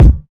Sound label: sharp kick drum sample a sharp key, bass drum sound tuned to the A# note; kick drum samples